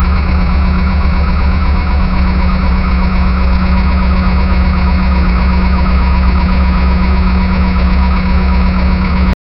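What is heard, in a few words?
heavy diesel generator idle, low rpm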